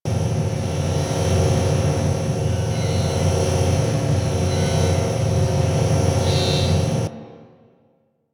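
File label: Maintenance Computer Hum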